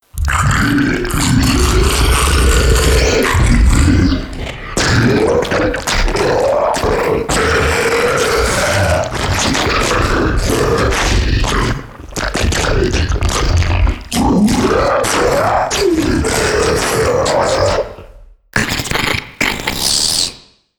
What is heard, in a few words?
jingle